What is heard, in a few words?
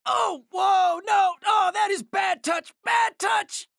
pain ability damage; anarki; low health